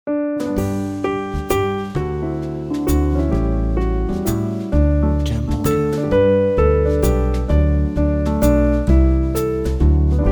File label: We Wish You A Merry Christmas